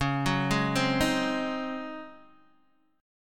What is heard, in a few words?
Db Major 7th Suspended 2nd Suspended 4th Db Guitar DADGAD